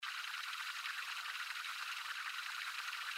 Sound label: Reel Spin; Valentine Blast